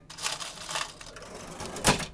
Cash; HapticSamples; Objects; Feel; NiceVibrations

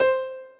Snake Piano; Feel

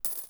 Coins; Feel; NiceVibrations

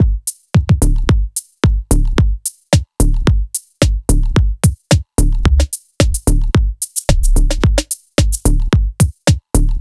Drums; Feel; NiceVibrations; Haptic Samples

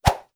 Attack; Feel; Feel Demos; Barbarians

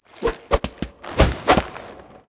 skill dash; rogue; High Ground Royale Netcode

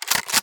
Shotgun Load; HapticSamples; Weapons; Feel; NiceVibrations

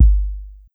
Sequencing Bass; Kit; Feel; MMFeedbacks; SequencingDemo

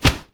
Attack; Feel; Feel Demos; Barbarians

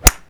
Golf Hit; Animancer; Golf Events

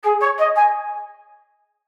Level Complete Sound; PlumberUltimateAds

